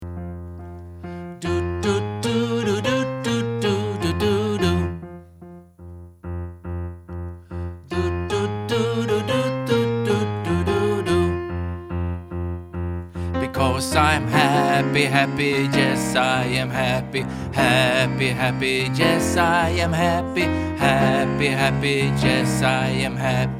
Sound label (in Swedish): bas